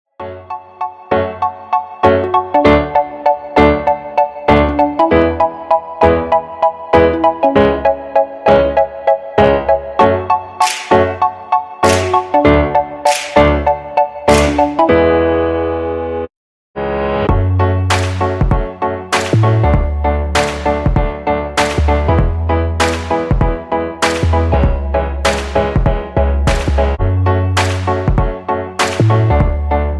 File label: pop ringtone